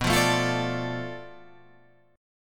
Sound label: D Minor, B; Dm/B strummed